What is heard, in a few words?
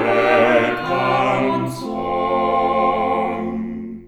quartet